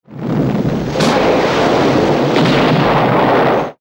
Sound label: Huey Missile Fired; Airwolf